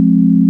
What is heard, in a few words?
sine; ものづくり基礎工学（情報工学分野）; 音の波形と分析